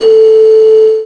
a4, flute, Bell + Flute, MIRAGE

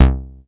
SHORT C3, BASS; Q Up Arts; Dream Experience

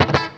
FX10, FX guitar; USB Soundscan vol.31; Miro s Grooves; AKAI

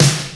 Snr 80S; Zero-G; Soundclash

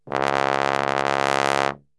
C#1 BASS TRB, Brass; Best Service; Brass Super Section

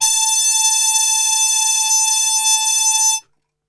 A 3, Brass Super Section, TRP HARMUTE; Best Service; 90 s Sample CDs

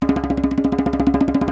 DJEMBE, African Perc

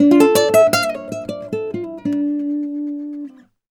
Flamenco, Guitar, dm

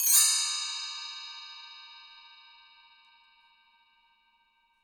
Bell Tree; Sonic Foundry (Sony Creative Software); Joe Vitale Latin Percussion

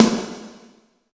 SNARE4; East West - Phat & Phunky CD2; 90's Sample CDs

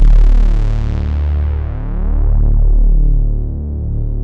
SUPER SUB, Synth Basses; E-MU Formula 4000 Series Vol. 2

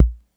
606 bass drum, TR606; Drums; Hits

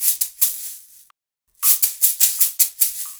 SHAK, Percussion Loops; 150 COMBI

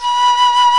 WINDS & BRASS

